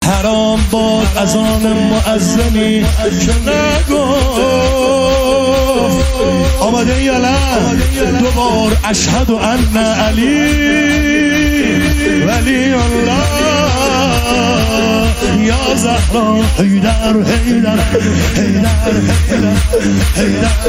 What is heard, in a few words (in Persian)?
مولودی